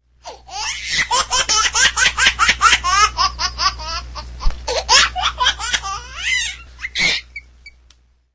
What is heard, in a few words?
Laughing